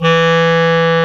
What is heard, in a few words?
WND CLAR2, Clar Jazz; Roland LCDP04 Orchestral Winds; 90 s Sample CDs; Clarinets